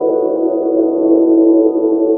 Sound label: pad (mystico); Sonic Foundry (Sony Creative Software); Ugly Remnants VOL-2